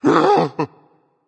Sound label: breath berserk; cuchulainn